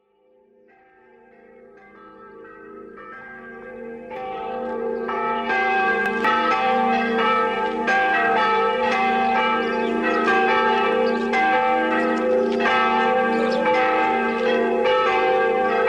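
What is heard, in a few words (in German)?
Glockengeläut - Kombination für Feier; hahnbach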